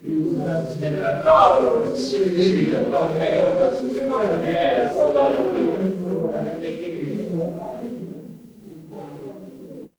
crowd murmur

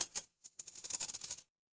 impact coins

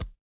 impact punch

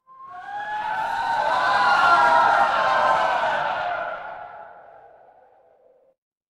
crowd cheer

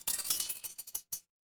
glass shatter

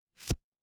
impact bow

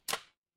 ui toggle

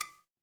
glass glass tap

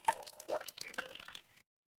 water drip

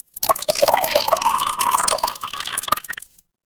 water pour

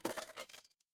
impact crash

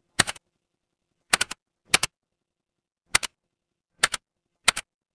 office keyboard

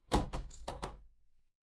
door open

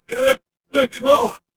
feedback achievement

